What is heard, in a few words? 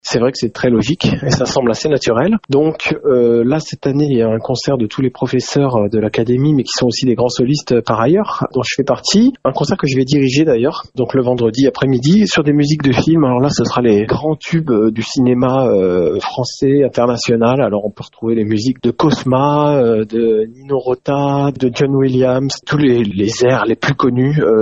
brass